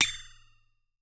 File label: FLEX-A-TO, FLEX-A-TONE; Voice Crystal - Steve Reid Definitive Percussion